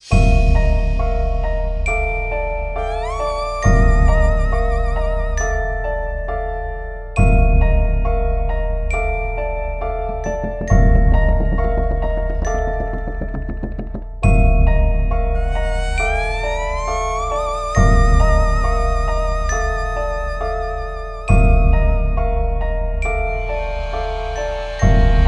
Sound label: Sitar